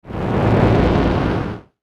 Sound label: Whoosh Distortion Crackle; Blastwave; Whoosh Sound Effects